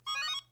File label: motorola krzr k1 ringtones